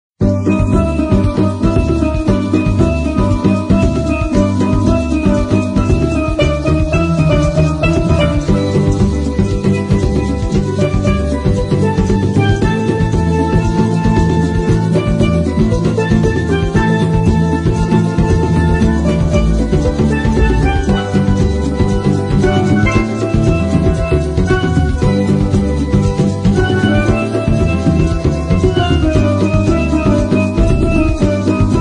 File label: Caribbean Steelband